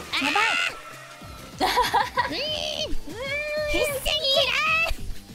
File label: malding noises